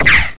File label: Shot; hydra castle labyrinth gamerip 2011